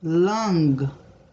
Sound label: tongue